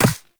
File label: Walk Ice, footsteps; Warframe; Characters; Operator